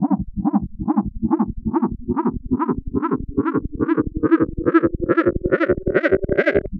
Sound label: Noise, LFO & Noise